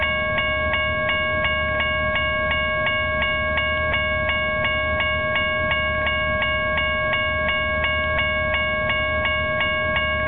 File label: modern grade crossing bell